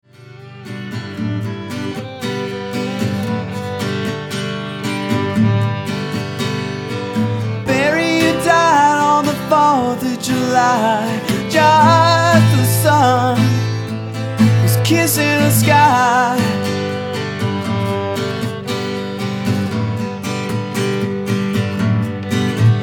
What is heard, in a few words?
acoustic